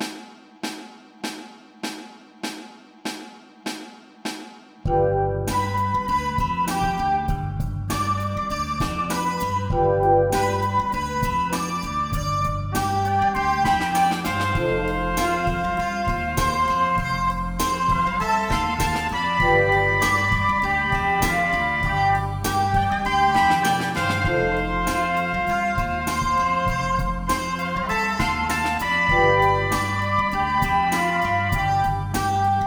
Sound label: Recorder; Recorder Swag Learning Speed